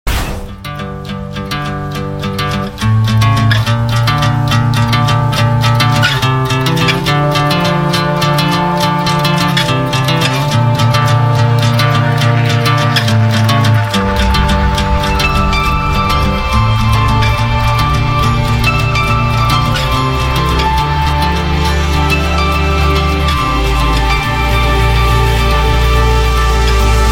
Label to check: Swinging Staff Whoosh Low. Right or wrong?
Wrong